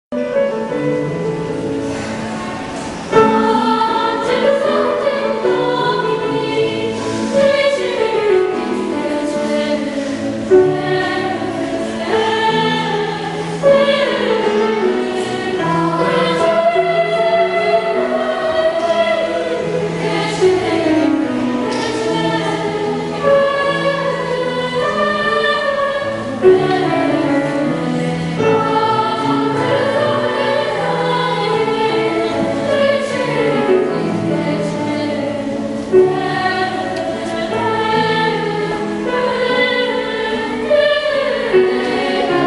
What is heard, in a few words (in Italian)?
Angelus Autem Domini, Coro, Concerto Voci in coro; G. Giorgi; Coro Akses; S. Agata Como